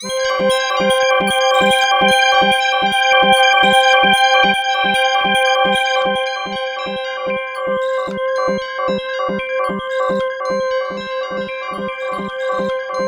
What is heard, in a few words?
C4, Synth Line; 90 s Sample CDs; NiceBeats; SynthLine VOL-17